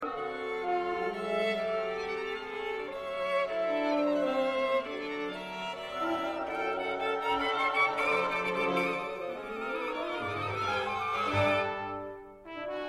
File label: Violin Concerto